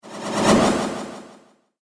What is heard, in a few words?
Flashback Transition Whoosh